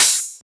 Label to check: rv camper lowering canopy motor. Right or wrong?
Wrong